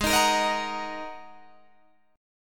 G sharp 7th Suspended 2nd G sharp Guitar DADGAD

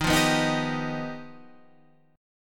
G Augmented 7th Eb

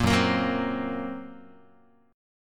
F sharp Major Flat 5th F sharp Guitar; F#Mb5 strummed

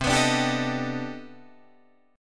C Minor Major 13th C down Guitar DADGAD

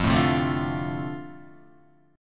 F 6th, Guitar; F6 strummed

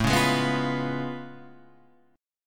A Augmented Major 9th A; A+M9 Chord {5 4 6 4 6 7} strummed

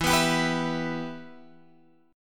E-Minor, Guitar, Open G; 14,16,17,16,17,x

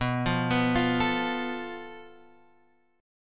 A Suspended 2nd Sharp 5th

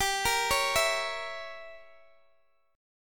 G Diminished 7th, Guitar, DADGAD; down

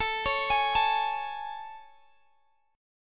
A Major 7th A, Guitar, Open G

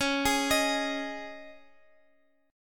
Db Suspended 2nd Guitar Open F; arpeggiated; x,20,20,22,x,x

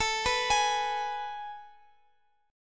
A Major 7th Suspended 2nd, Guitar, Open F; AM7sus2; arpeggiated; x,x,x,16,14,15

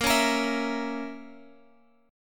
A sharp Diminished Guitar Open G, chord

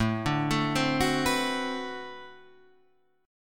D 13th, guitar chord

